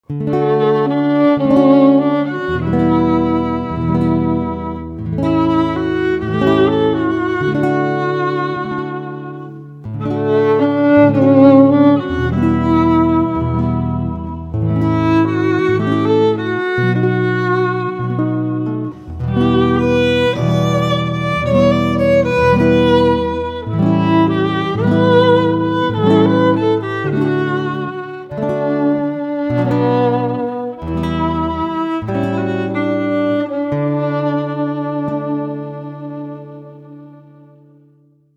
Duo of Guitar & Violin

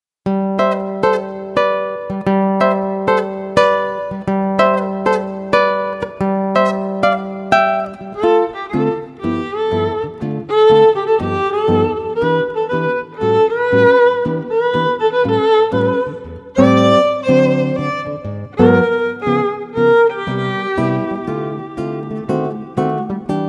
Duo of Guitar & Violin

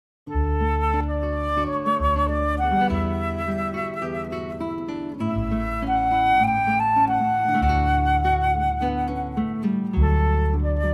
guitar flute bass trio

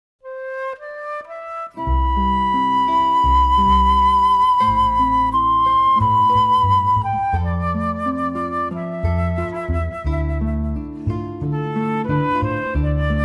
guitar flute bass trio

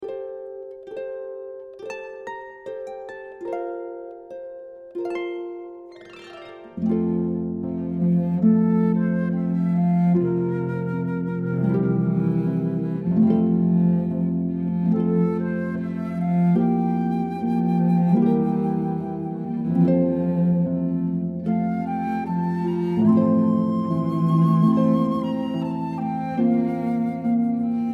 harp flute cello

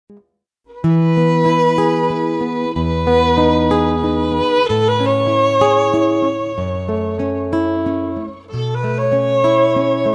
Duo of Guitar; guitar violin; Lyrica Demo

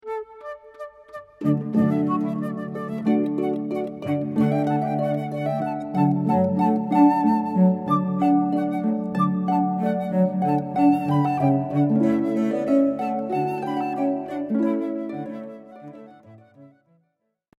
Rejoicing, Handel, harp flute cello

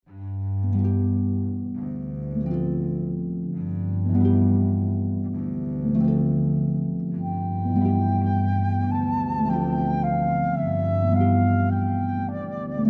Gymnopedie, Satie, harp flute cello

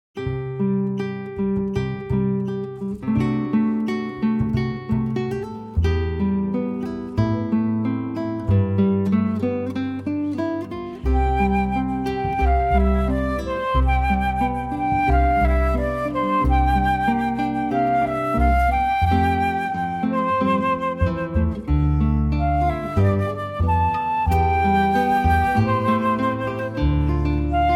Ice Castles, guitar flute bass trio

